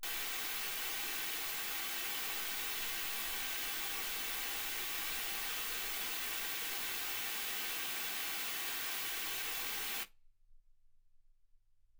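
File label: white gaussian noise 48kHz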